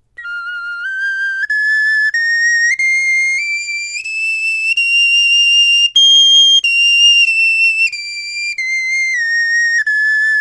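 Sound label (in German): tonleiter, Knochenflöten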